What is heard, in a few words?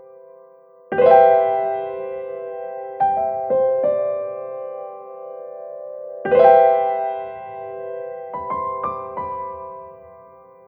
Dream Grand, keys